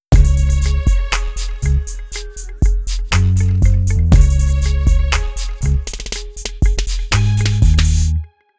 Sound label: jingle